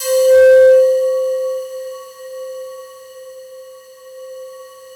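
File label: FOG ATTACK C4 Left, Yamaha VP-1; Music Store; 90's Sample CDs; FOGATTACK 2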